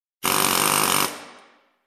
Metal Drilling

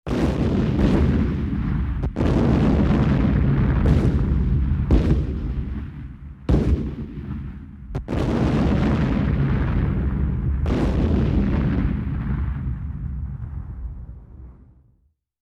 Multiple Artillery Shots

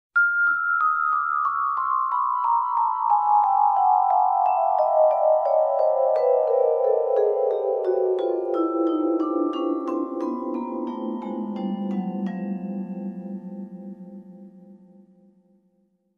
Mallet slow arpeggio scale down

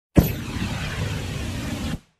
Rocket being launched

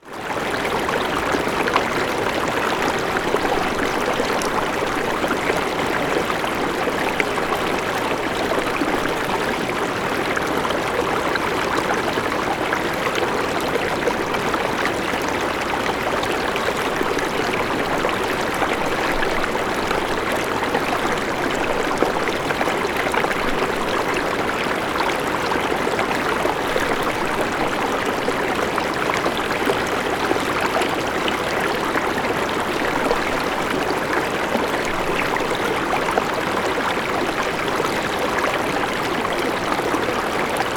River flowing and splashing

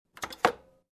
Airliner Seat Table Folded

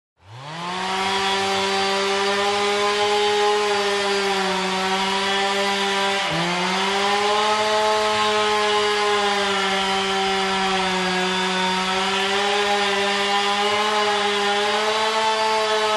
Chainsaw in distance

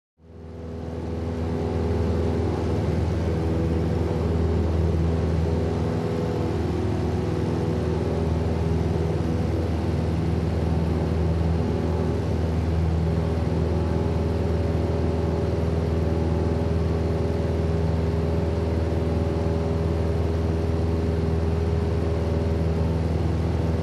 Airliner Propeller Engine Noise

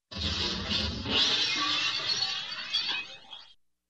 Glass shattering in slow motion

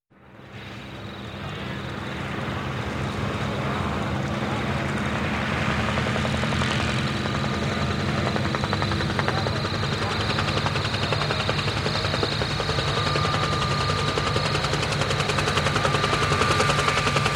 Air Ambulance Helicopter rotor sound

